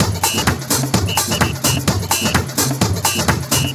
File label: Latin House Loop 128 BPM; Vengeance Samples